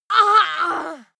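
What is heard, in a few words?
female combat hit hard, screams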